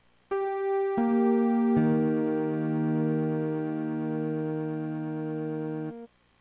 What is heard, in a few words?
pling; Msntv; msnMusic